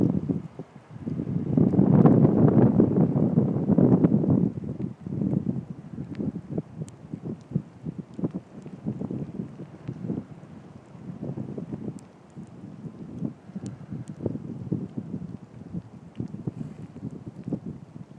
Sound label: windy weather, the Hope Valley